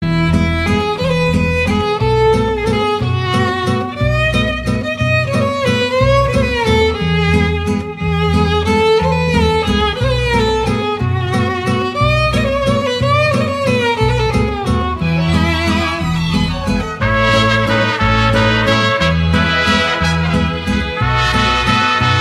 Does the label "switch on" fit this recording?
No